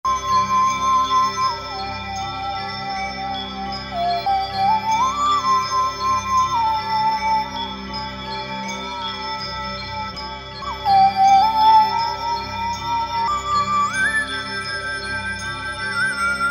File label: Roland V-Synth; Snowflake; Factory Patches